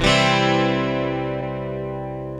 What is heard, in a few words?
GUITAR, ROCK POP; MAGIX